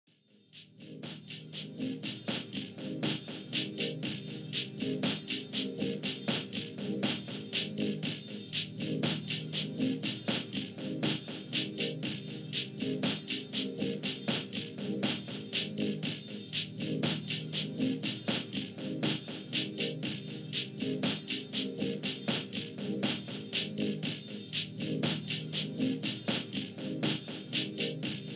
loop; Wintry Cliffs

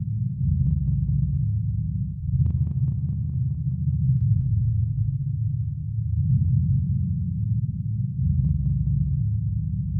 music

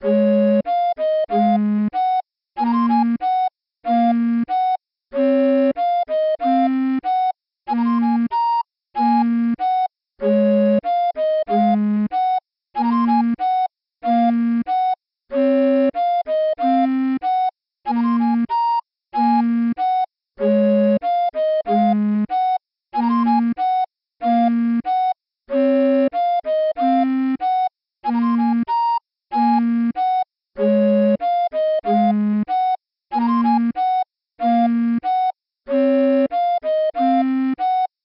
bgm recorder; Abyss of Farewells

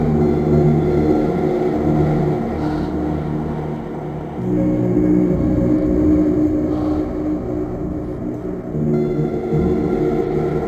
loop

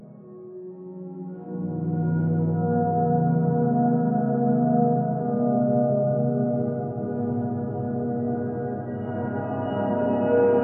burmese

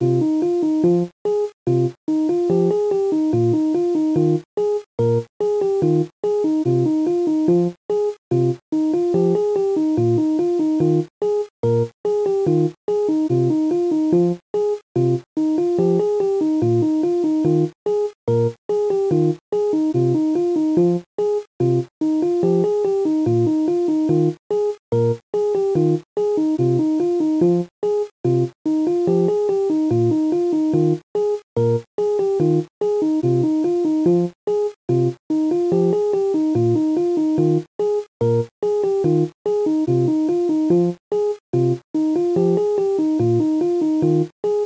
bgm elepi; Mystery Library

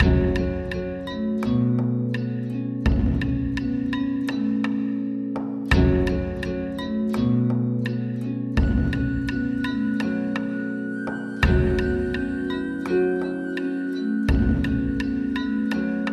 Walking slow

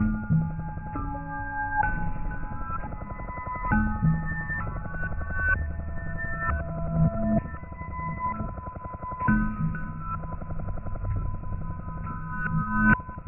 Bgm slow

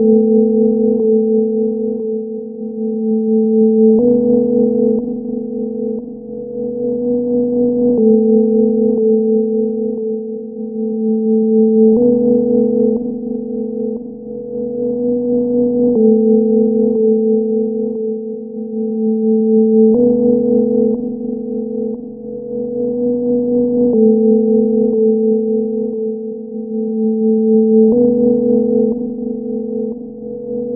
tone

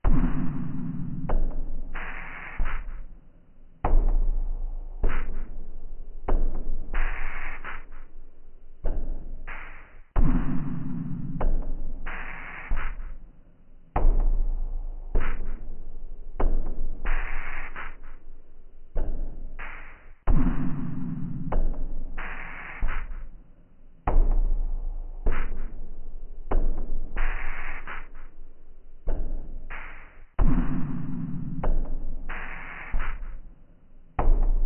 Tom slow

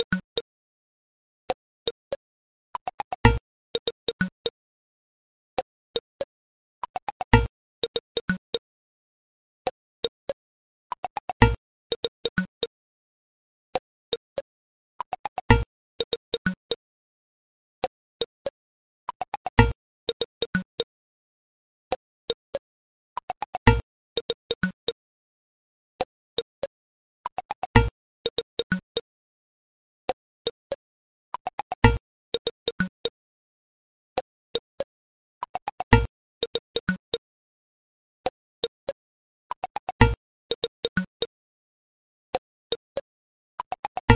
organ

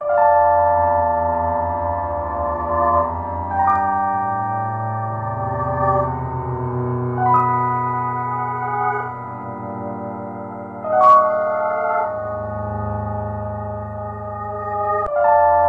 deep sleep

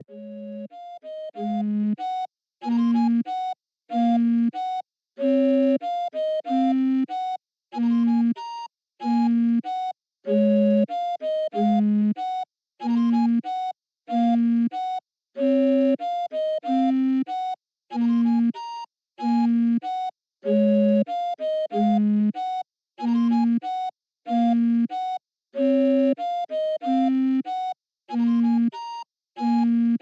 bgm Accordian

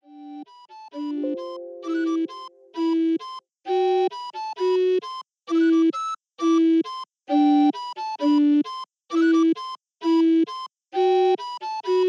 Accordian

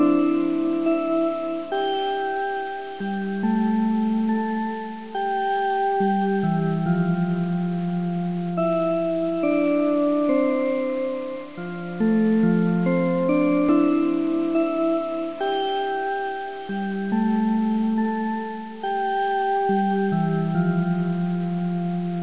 Town Phonograph witch; GALAXY Town Phonograph

